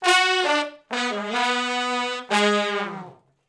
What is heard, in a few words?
Shuffle Blues Riff (B) unison, Horncraft for R&B; Sections